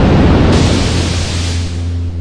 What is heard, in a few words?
appear, video games; warrior